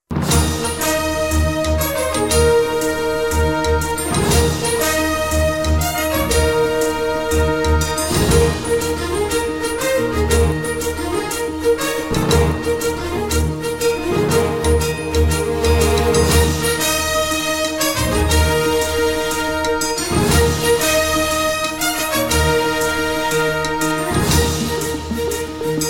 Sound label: tv music